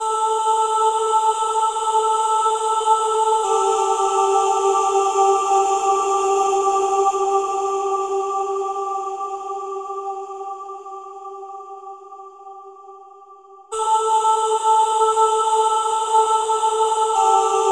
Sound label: Solo Vocals Am 70Bpm; Construction Kits; STEMS; Ghosthack; SkyRealm; Kit 20 - Sea Of Souls